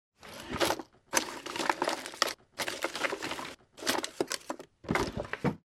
jashhik v kabinete so vsjakoj melochju

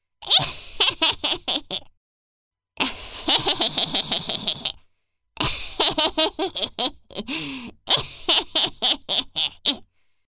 smeh vedmy shaluni; Для Хэллоуина; смеха ведьмы; Коварный злой смех старой ведьмы, забавный смех

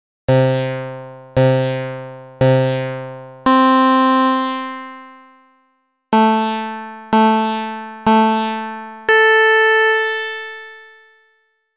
dva varianta sinteticheskih udarov otscheta na 3 sek; Звуки обратного отсчета

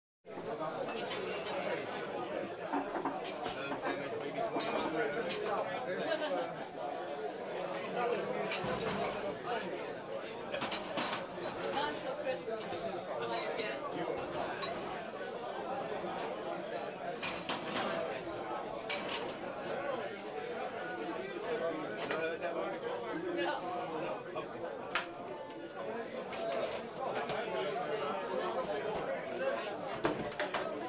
den spokojnaja atmosfera posuda

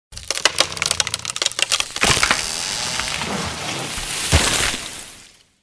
korotkoe padenie i dolgij skrip

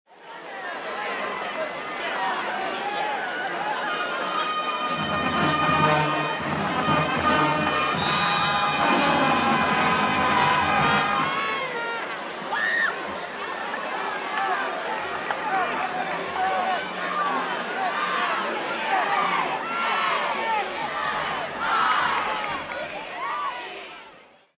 bolelshhiki shumjat dujut v dudki i vizzhat; Звуки футбола; Звуки игры в футбол

